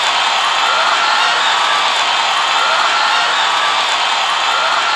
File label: Crowds; Vengeance Samples; VEC2 FX